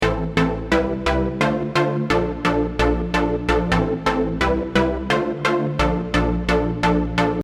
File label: exciting chord; djduanewoods